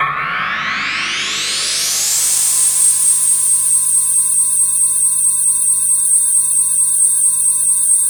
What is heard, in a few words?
EFFECT, Explosive Jungle